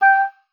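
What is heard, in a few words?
G5, oboe d'amore; Xsample VOL-7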